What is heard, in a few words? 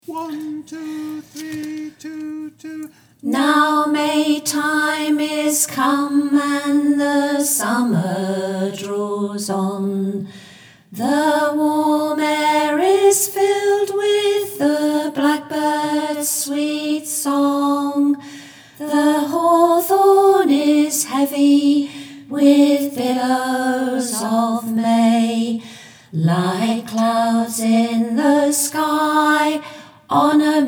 Alto Learning; Maytime is Come